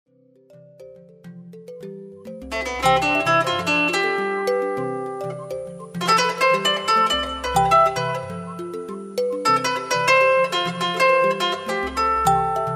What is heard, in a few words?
string studio vs 3 happy spirit; string studio vs 3 demo; christian laffitte